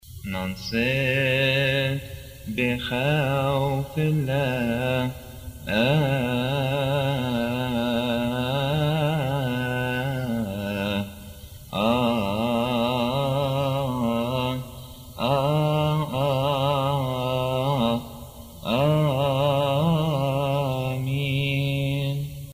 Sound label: eproskhomen arabic; Altar Responses